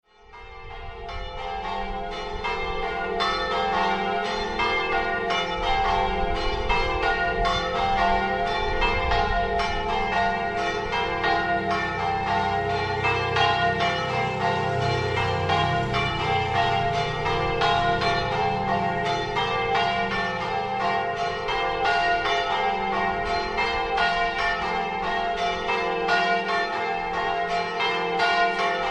SAN MAURO di Premariacco, campane

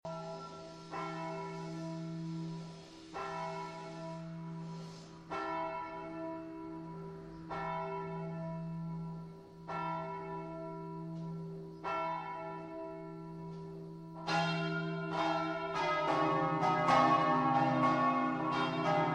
MELS BENE, campane

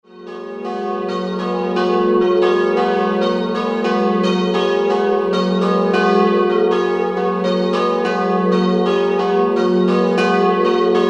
Campane Fusine San Leonardo

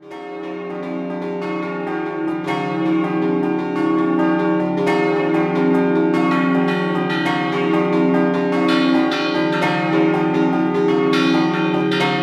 POVOLETTO, campane

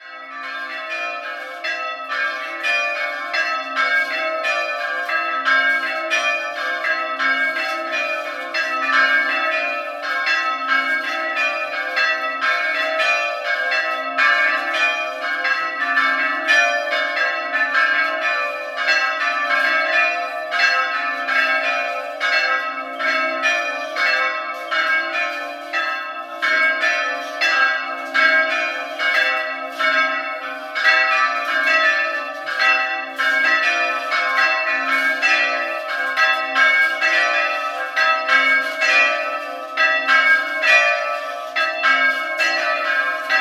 Caneva Castello, campane

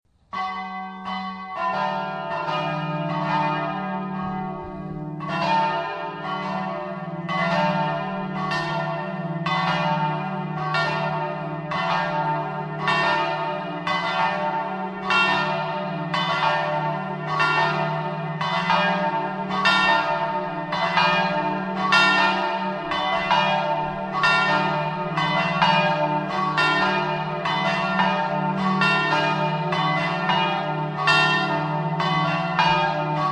PURGESSIMO, campane

